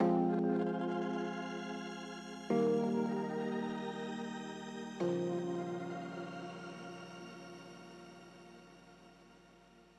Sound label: MELODICS, viral hiphop